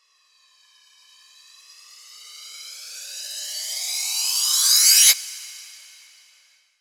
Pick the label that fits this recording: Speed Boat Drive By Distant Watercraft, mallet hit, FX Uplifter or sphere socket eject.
FX Uplifter